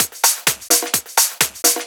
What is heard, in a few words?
Discohouse Loop 128 BPM